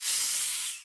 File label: Rattle Snake